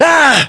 pain

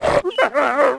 wolf die vox; Civ4 warlords

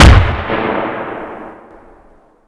Inf Weapons; Red Orchestra 41-45

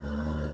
Horse Fort Vox; Civ4 warlords

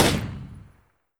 automatic PKT end, weapons; DCS

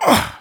throw

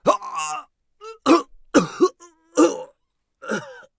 agony; Escape from Tarkov; scav3

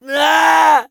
death; Escape from Tarkov

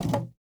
ui pickup wood med; Rust

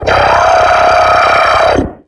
biomech vce die

